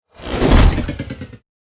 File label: footstep; robowolf